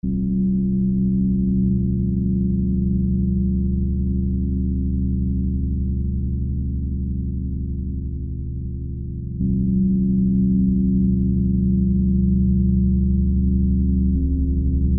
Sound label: Car